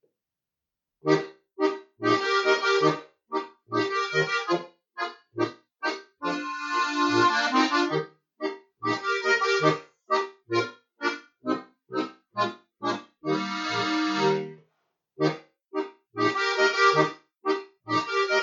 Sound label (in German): Orgel Boarischer Es; Salzburger Volksmusikverlag